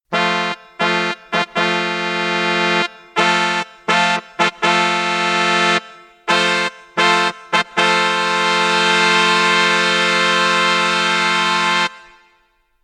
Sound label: fanfare; anime